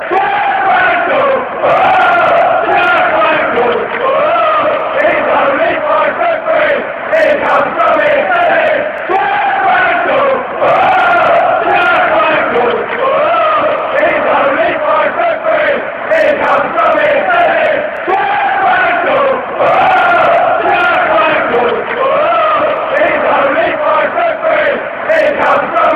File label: woah, fan chants; gianfranco